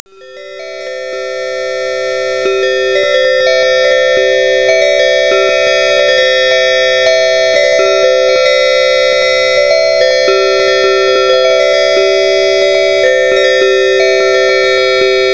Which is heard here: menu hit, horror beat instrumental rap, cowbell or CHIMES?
CHIMES